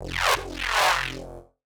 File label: Bass Neuro F